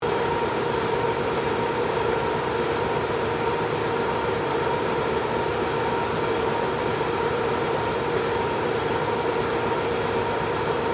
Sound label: Printing Press, Industry, Printing, Press, Loop; Machinery; Machines; BlastWave